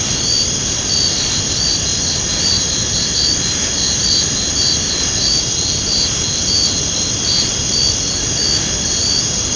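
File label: background music; Virtual Graceland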